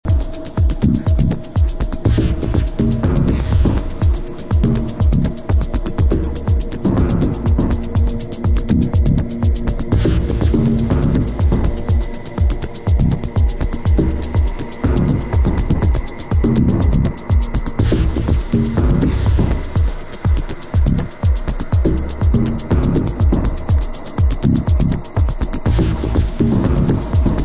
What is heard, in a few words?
static fragile